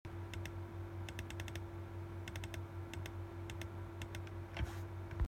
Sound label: logicool m100r wired mouse click